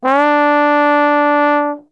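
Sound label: D 3 trombone glissando, Brass Super Section; Best Service; TRMB GLISS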